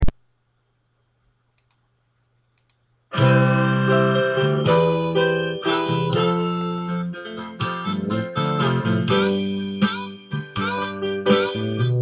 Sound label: Harmonica C